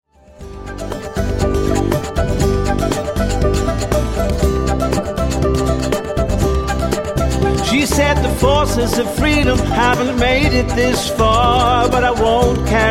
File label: rhythm